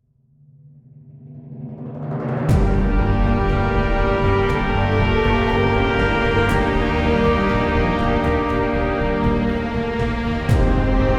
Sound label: Battle Main Title